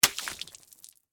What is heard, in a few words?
Water Balloon, Splash, Impact, Wet; Balloons (Sound Effects:Cartoons); BlastWave